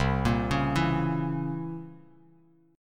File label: C 7th Flat 9th C down Bass Standard; C7b9 arpeggiated; JGuitar